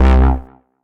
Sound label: Analog Bass; Ableton; Retro Synths; Analog Bass Vol 2